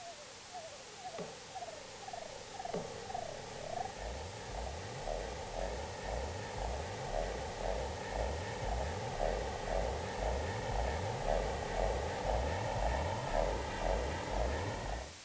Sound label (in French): son peur; de enfer2k